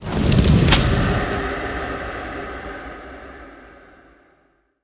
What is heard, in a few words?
wing gun draw; zm boost; privilege; mboss; winggunex